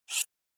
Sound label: navbar open